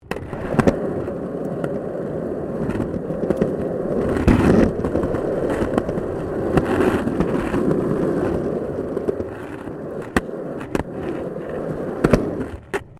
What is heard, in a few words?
SKATEBOARD UNDERSIDE CONCRETE; Skateboard sounds; Skateboarding; Sports